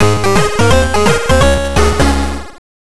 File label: Lose Life; Looney Tunes Carrot Crazy; Game Boy